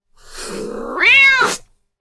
cat hiss

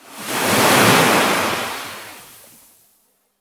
ocean waves

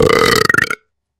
burp classic

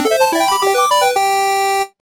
game victory

